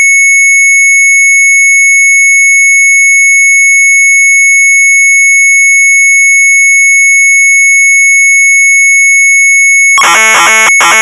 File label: MZ800 Tape, sharp; Head on; retromelsarchive